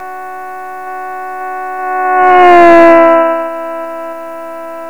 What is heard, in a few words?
doppler